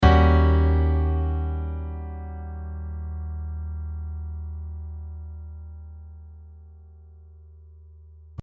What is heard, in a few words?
Eb7 chord voicing